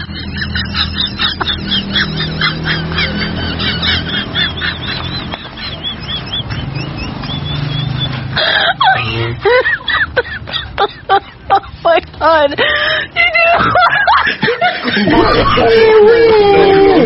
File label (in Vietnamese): Tieng cuoi den khan ca giong tiktok